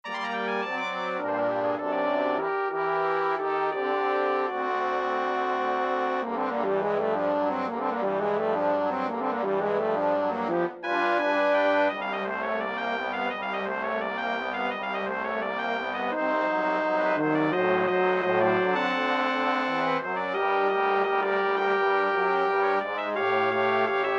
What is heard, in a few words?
Brass Quintet